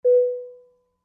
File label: Aircraft Bell Announ, warning signals